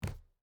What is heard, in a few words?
footstep barefoot wood jump land; Rust